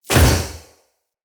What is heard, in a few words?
Ghost Flesh Impact; Weapon Impacts